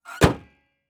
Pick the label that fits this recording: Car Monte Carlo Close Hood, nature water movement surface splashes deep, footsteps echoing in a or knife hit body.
Car Monte Carlo Close Hood